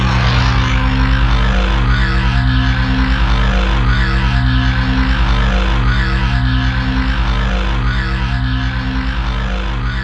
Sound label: barrier inside decay; cstrike; Valorant